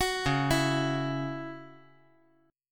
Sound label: Db Minor Double Flat 5th Db; arpeggiated; Dbmbb5; JGuitar